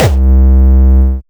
Distortion Kicks, Bassdrums

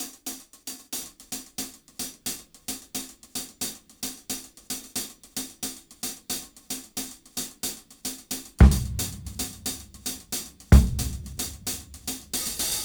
Fun 112 BPM Hat; AMG - Keith LeBlanc Phat Butt Jams

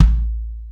KIK, Real Kiks; Club-50 - Foundations Roland

